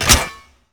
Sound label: ui hud lock camera release; LoL